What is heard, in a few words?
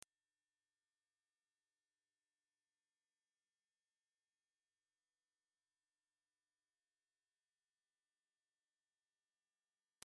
17KHz